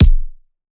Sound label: Punching Percussion, Straight Hand, processed